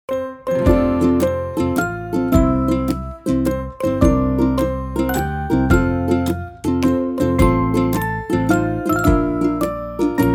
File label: happy birthday, music